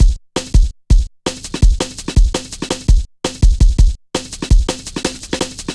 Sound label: Rollin Shaker, Drum Loops 166.5